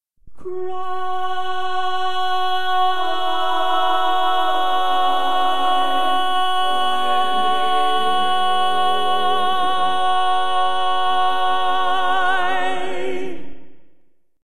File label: Cry, Lead